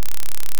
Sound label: DC offset Wave C#1